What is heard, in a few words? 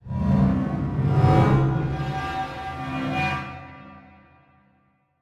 Transition Metallic Warble; Production Element; Title Transition; AdobeCC